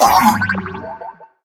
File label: incubator birth; darwinia demo